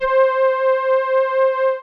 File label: TWO CELLI, poly 800; TECHNO-BWARE